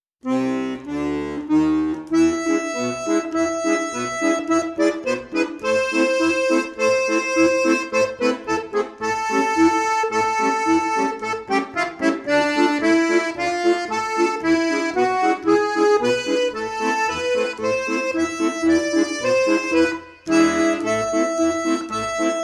Csardas Akkordeon Komposition

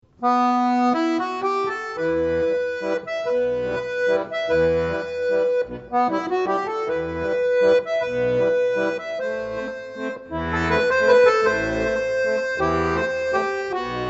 Akkordeon Arrangement

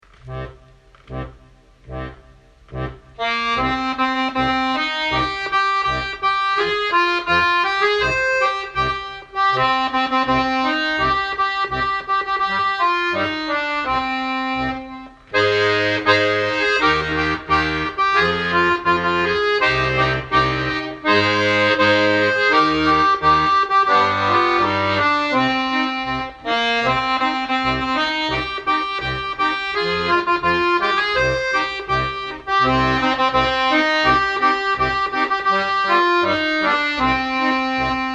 Akkordeon Arrangement; The Wellerman

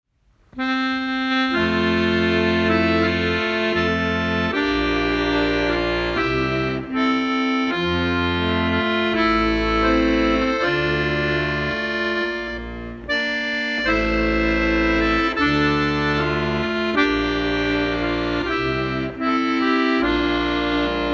Auld Lang Syne Akkordeon Arrangement

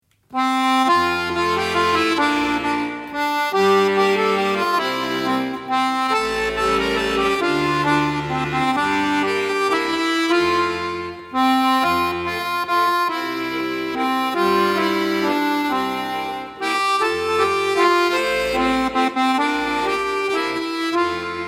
We Wish You A Merry Christmas Akkordeon Arrangement

